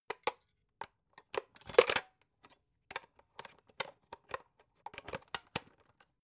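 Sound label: electric whisk patch handle, kitchen; haus-garten; kueche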